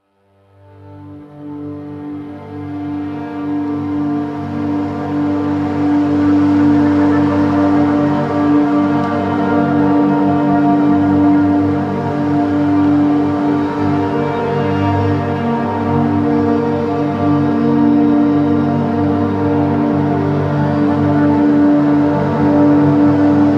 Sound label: Nocturne Ambience